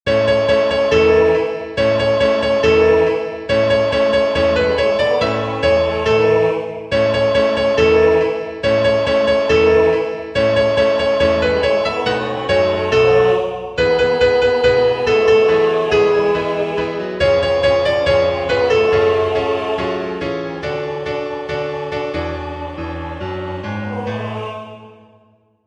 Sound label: master house soprano